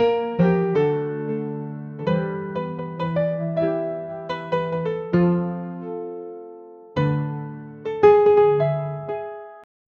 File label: sad melancholic music